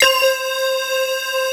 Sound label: D50 dual bells; East West; Global Sounds